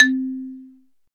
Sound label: MLT R8 XYL, R8 Xylophone; Roland LCDP11 Africa VOL-1; Bala & Xylo